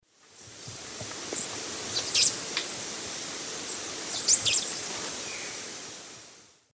Dacnis lineata, feeding calls; S. America; Eastern Lowlands

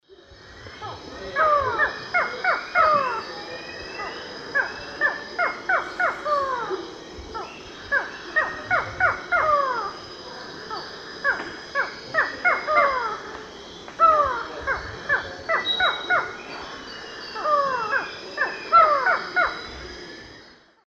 Micrastur gilvicollis, duet; S. America; Eastern Lowlands

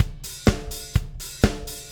Drums, Rock

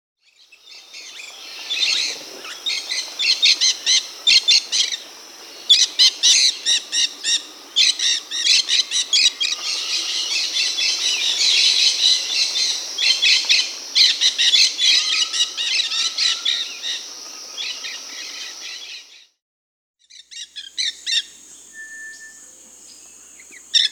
Dusky-headed Parakeet (Aratinga weddellii), Peru; S. America

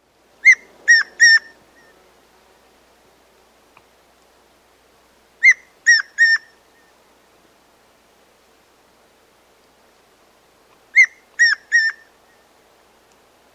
Tawny Antpitta, S. America

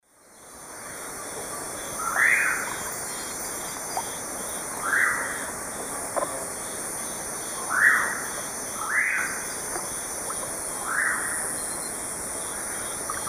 Nyctidromus albicollis, songs 2 birds; S. America; Eastern Lowlands

